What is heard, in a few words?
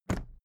briefcase plastic put down; industrie wirtschaft; bueroarbeit